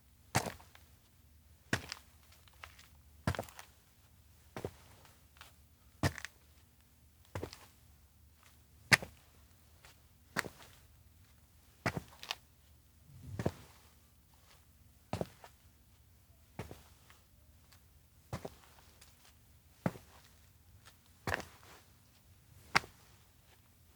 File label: steps outdoor town stroll loop; anemoi